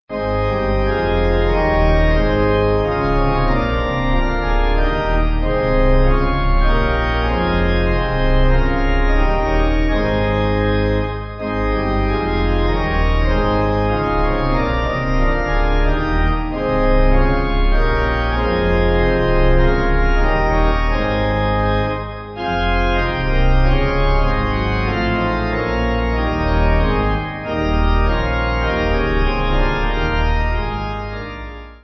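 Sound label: Pipe, Pipe Organ backing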